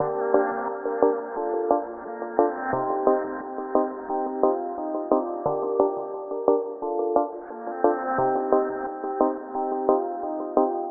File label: Pluck C Minor 88bpm